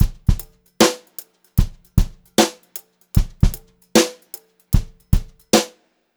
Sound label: brushed drum loops, beat; USB Soundscan vol.39; AKAI; 76 HOTROD